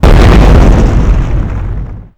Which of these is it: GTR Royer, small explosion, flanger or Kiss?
small explosion